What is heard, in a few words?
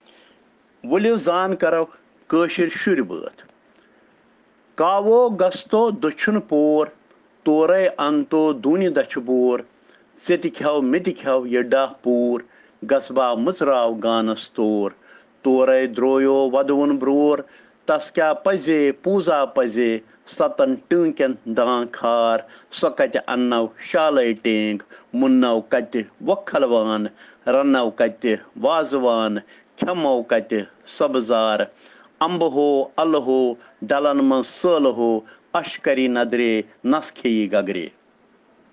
Children Rhyms in Kashmiri